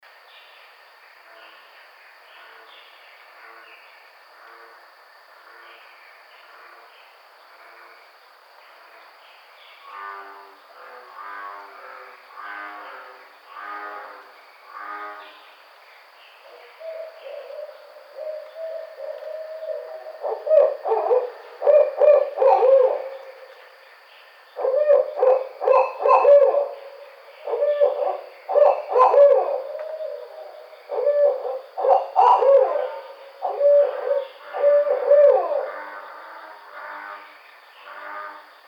Barred Owl

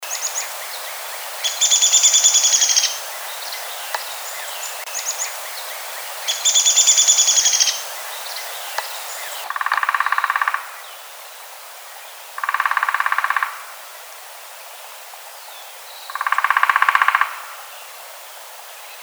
Downy Woodpecker

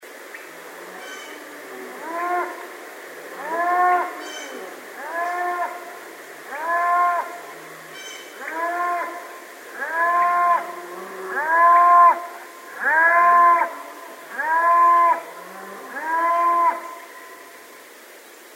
Cattle

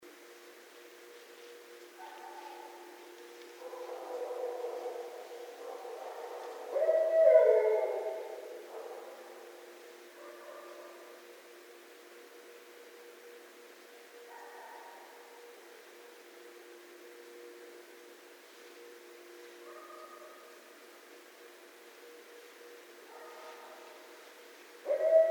Barred Owl

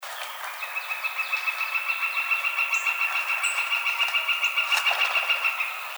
Northern Flicker

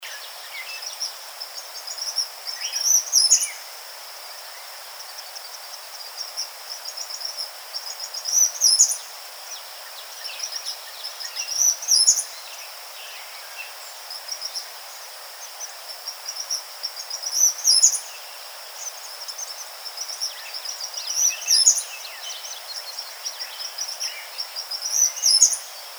Eastern Kingbird

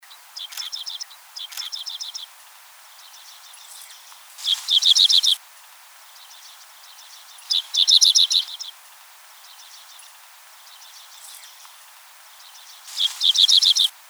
Dickcissel

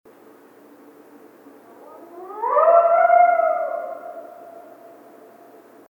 howl, Coyote; Canis latrans; Coyotes (Canis latrans)

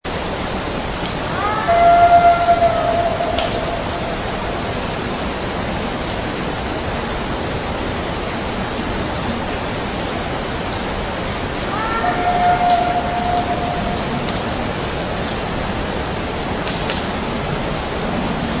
vocalization; San Juan 09

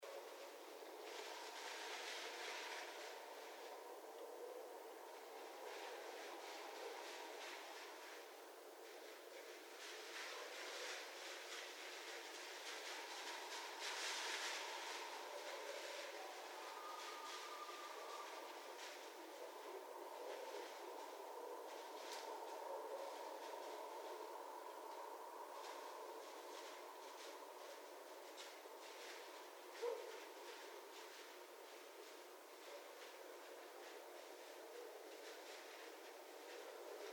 Red Fox long; mammals; North America

